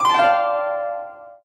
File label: bonus wild stop; Butterfly Lovers; wazdan